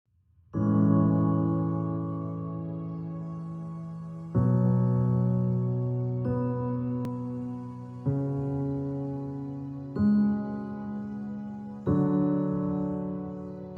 Piano Relaxation

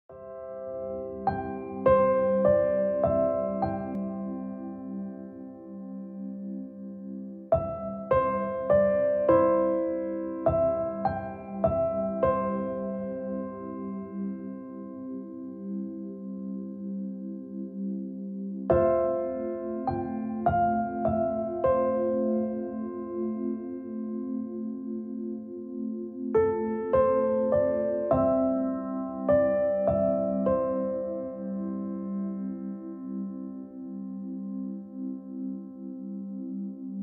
Piano Relaxation

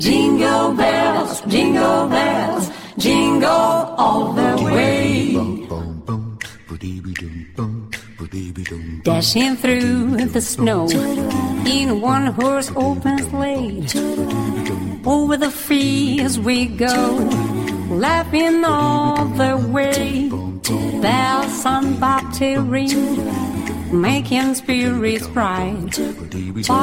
Jingle Bells (Acappella); Navidad; Lounge-Jazz

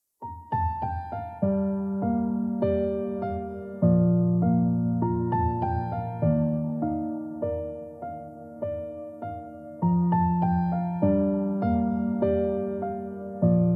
Piano Version

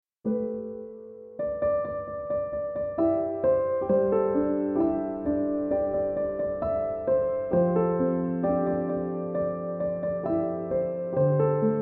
piano instrumental

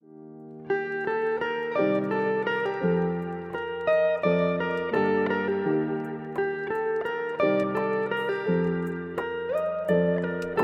Instrumental Lo-Fi

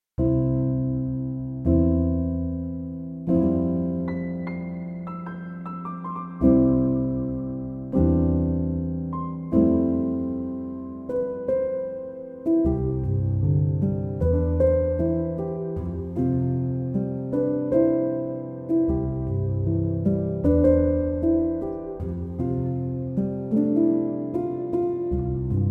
Piano Instrumental

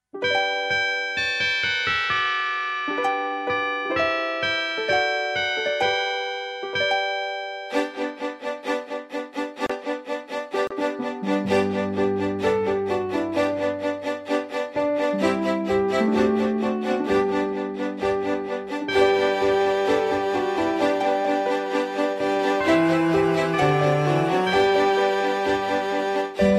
Ding Dong Merrily On High (Instrumental); Christmas

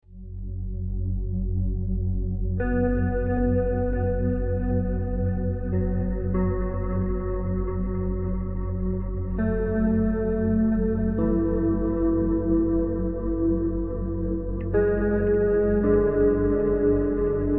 Spa Relaxation, Peaceful Music For Massage

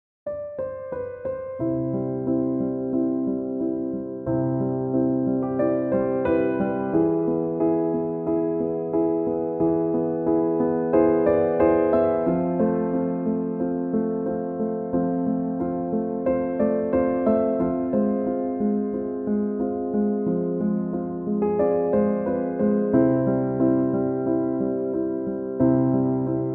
piano instrumental

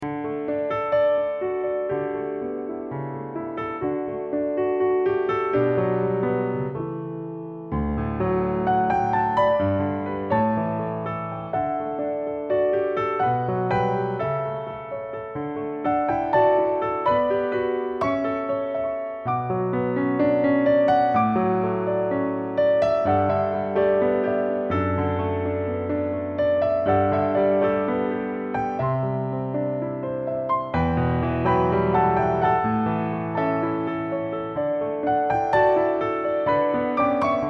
Piano Instrumentals; hotusa recepcion y pasillos

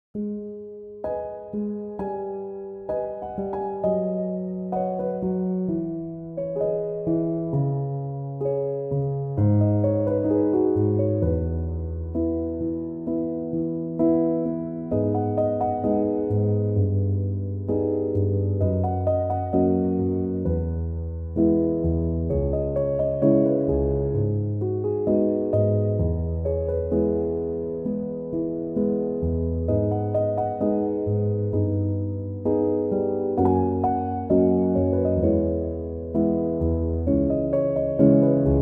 piano instrumental

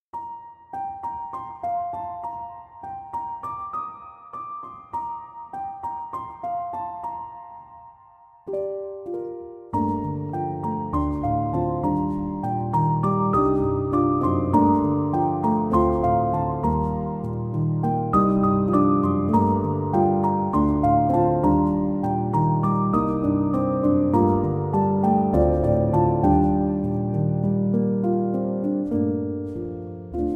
Piano Instrumental

